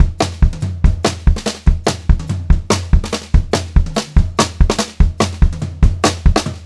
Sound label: 144 SPEED, Pop-Rock Drumloops